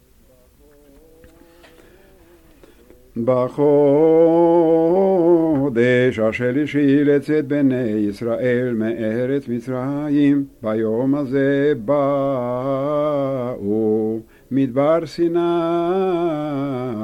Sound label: Parasha repeated, Shavuot